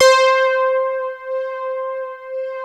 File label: POLY OBIE C4; USB Soundscan vol.49 - Analog Fantasies; AKAI